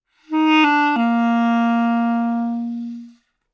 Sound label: clarinet phrase Bbmaj 100bpm; bespoke - Floral v.2